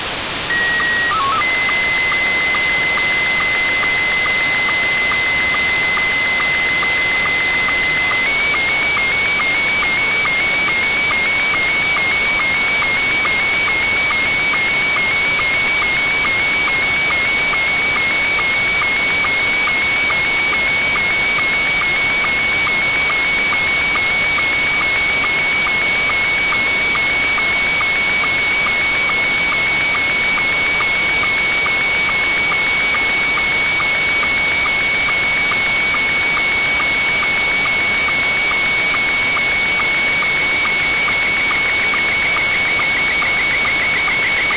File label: noisy